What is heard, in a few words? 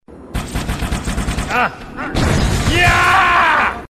ahhyaaa